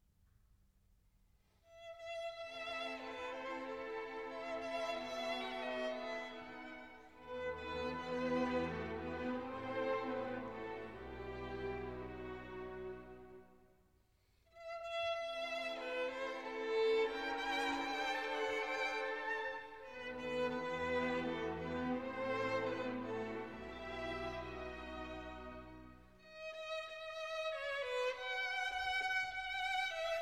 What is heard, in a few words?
Concerto in E Minor for Violin and Orchestra